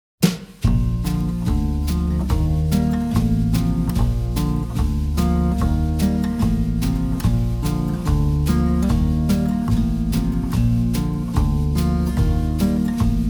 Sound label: Instrumental